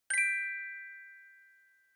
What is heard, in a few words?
Music Box Multi